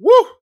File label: woo